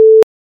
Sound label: Silence with 440Hz pip at start